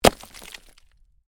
Ice chunk heavy, Ice: large chunk of ice lands on hard surface; Snow; Stuart Duffield